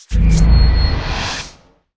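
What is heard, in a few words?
ring strength equip; arkane; character sheet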